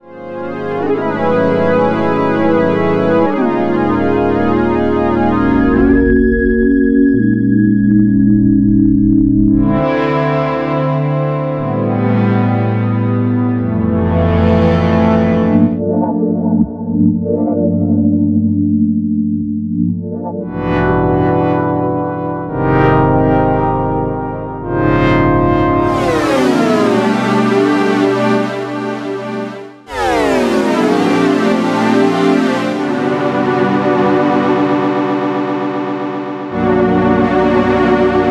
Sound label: Synth Pads